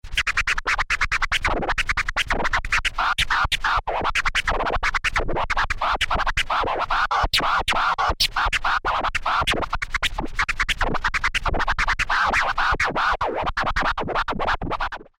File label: Scratch Ramp